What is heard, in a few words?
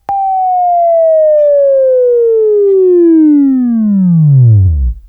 WAV Left, Sweeping; Sweeping Training program